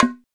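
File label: Double Drum, ethnic percussion; Drums In Your FACE!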